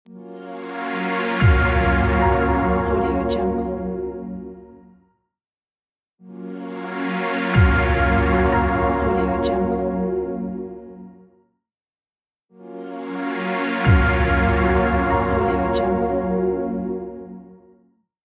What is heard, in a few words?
elegant short logo; rawaudiolab